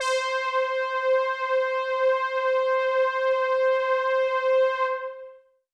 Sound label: Pad C, Synth Prophet One Shots; Electronisounds